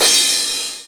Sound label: crash, Dance Mega Drums; Best Service; CRASHES